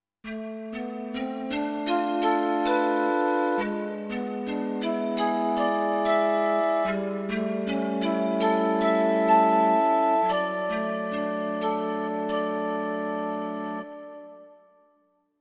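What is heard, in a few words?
Calliope Lead, digital piano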